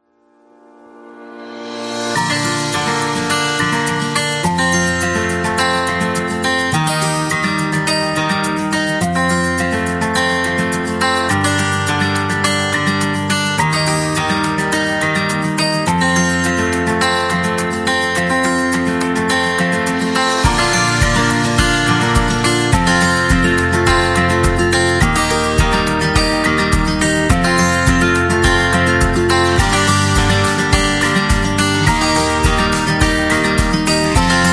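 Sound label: Elegant, Corporate background music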